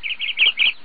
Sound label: bird yellow; minecraft; technic